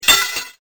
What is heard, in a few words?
metal